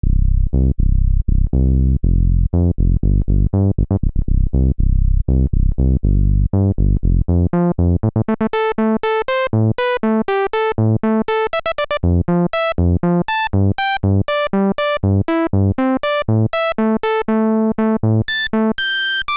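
novation nova desktop filter resonant low pass filter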